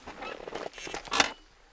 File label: chair lean back